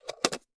cup lid open

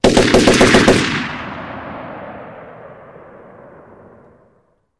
Machine Gun Multi Bu, bursts

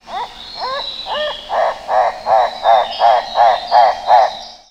Macaw Croak Calls

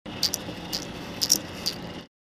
Chemical Dispenser, hospital

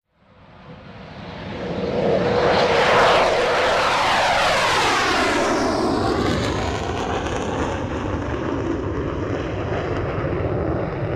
A3 Skywarriors Take

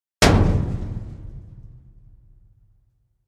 Dumpster Type 2 Hit with Sledge Hammer

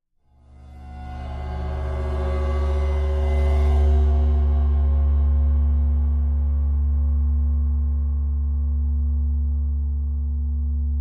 Ride Cymbal Bow, cymbal & gong; Old Ride Cymbol Bow CU

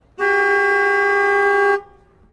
Car Horns

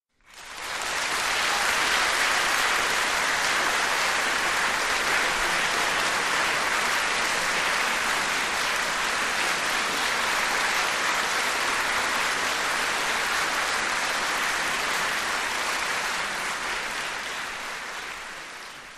Audience Applause, crowds int

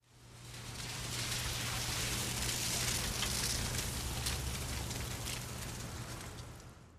Car Medium Speed Cu On

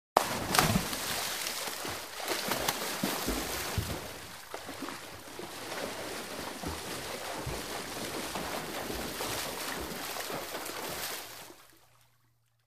Swimming Dive Follo

